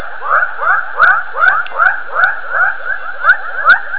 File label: walkway; Thailand